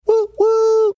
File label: whoo whoo